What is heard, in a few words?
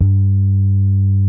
DYNA SOFT G3, DIGITAL BASS; Music Store - Claudius Diemeter Schwerlos